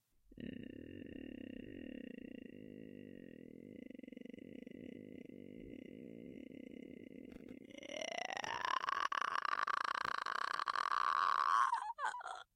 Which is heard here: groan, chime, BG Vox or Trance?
groan